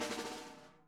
SNARE, Interactive Drum Kits

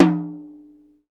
TOM HI, Roland; Club-50 - Foundations Roland; Naturals A

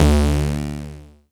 Reece Project Bass; Big Fish Audio; Old Skool House; OSH Bass

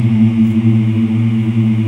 VOX AFMM, VOX Afro Mms, Africa

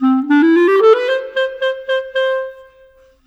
Clarinet, Cinematic; Sonic Foundry (Sony Creative Software); Orchestral Series VOL-3

